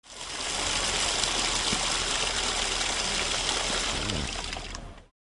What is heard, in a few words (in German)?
wasser abstellen